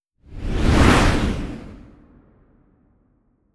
Skill Fire

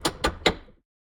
Ambience Scatter Machines; Josh Journey Darkness Totems; Santuario

